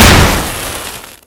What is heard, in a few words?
fire nade; garrysmod